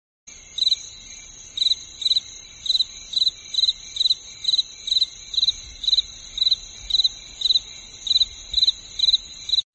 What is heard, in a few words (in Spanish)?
grillos